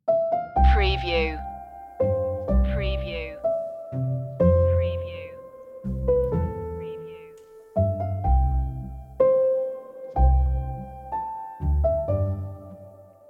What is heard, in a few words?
sleepy lullaby no harp